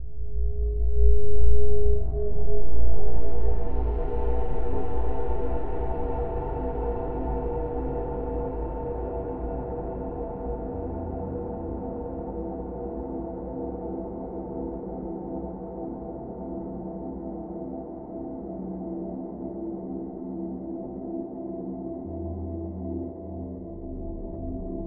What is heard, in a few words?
Tension Builders Inside